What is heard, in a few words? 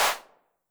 HAND CLAP Reverb Pitch Width, 909